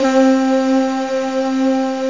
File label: echoes; gmplay